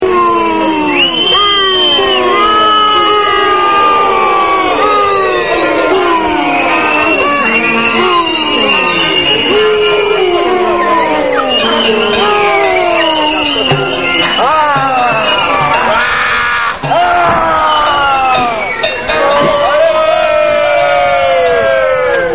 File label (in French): ou ou; HOOOUUUUUUUU !!!!!!!; CITY HOCKEY